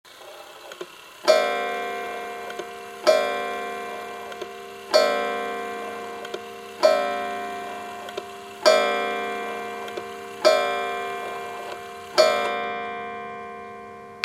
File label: Windup Strike